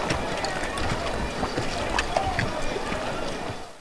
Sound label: CLAY; Caesar III